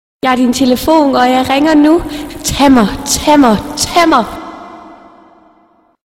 ringtone dansk

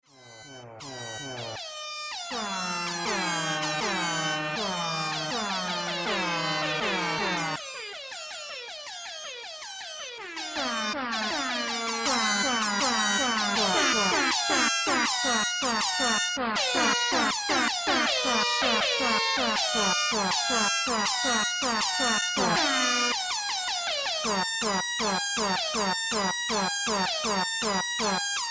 Airhorn

